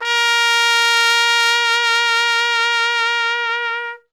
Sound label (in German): TRP WEICH, Alpine Volksmusik; Best Service